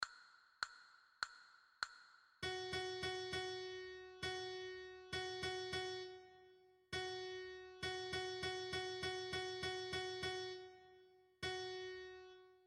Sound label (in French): Fa diese, flute a bec